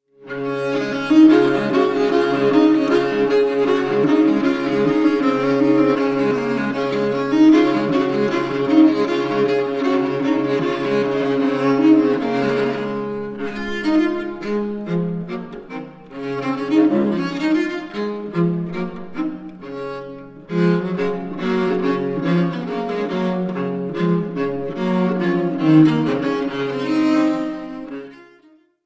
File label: suite no 6 in d major for cello solo; bwv 1012; gavotte 1 and 2